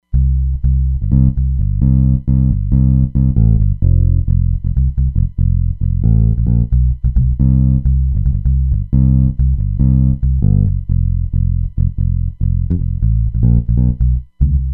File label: Roland Fantom XR